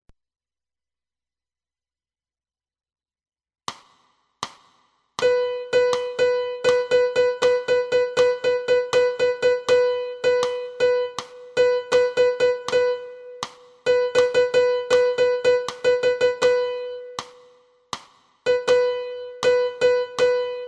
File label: piano